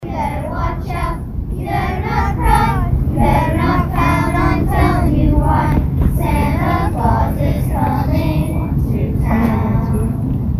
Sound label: kids singing